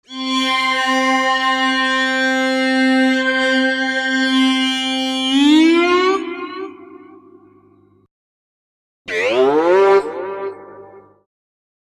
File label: Moog Modular